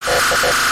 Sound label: scream; sonic exe